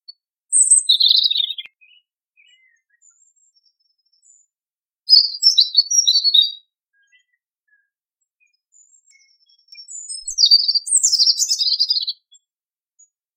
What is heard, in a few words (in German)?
Rotkehlchen